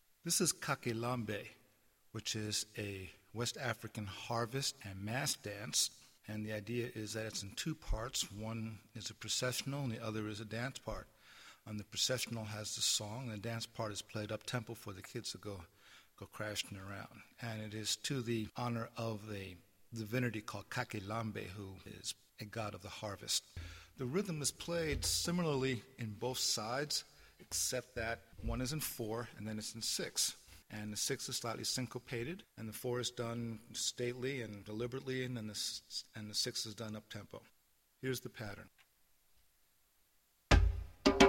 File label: Kakelambe Patterns